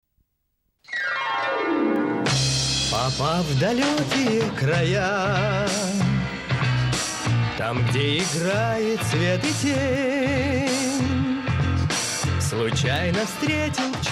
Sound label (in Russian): Песня